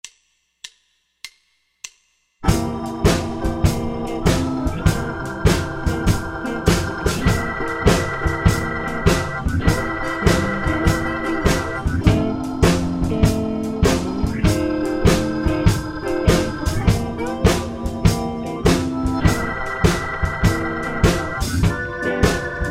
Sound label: Beginner Blues Basic Blues in C